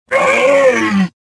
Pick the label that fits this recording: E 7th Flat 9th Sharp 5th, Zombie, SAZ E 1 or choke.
choke